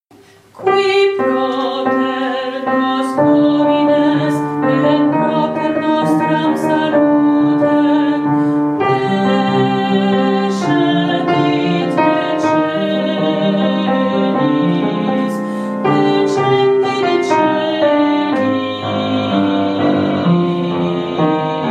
credo alto

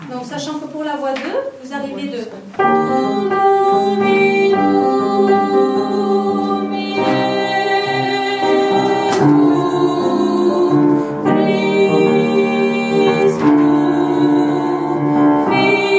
credo mezzo

